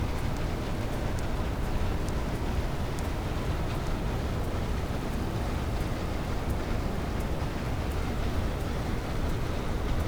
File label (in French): cachalot negatif, Physeter Macrocephalus